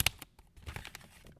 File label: Drop